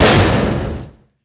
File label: Door Break